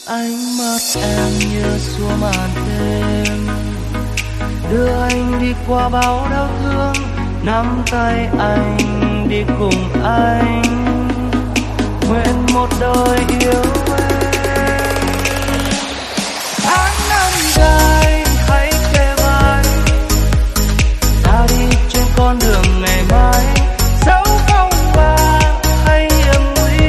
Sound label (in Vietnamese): Nhac Chuong Remix